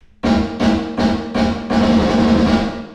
LATE REVERB Warm Plate

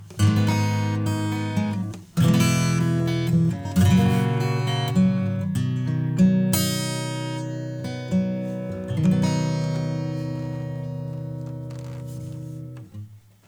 preset Acoustic Guitar Clarity; Neutron 4

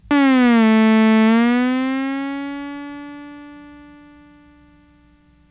Nord Lead 4 Enve mod R down

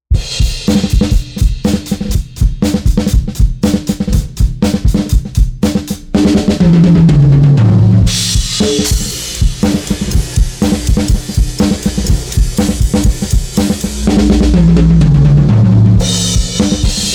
bass boost; cola 415 pre 4

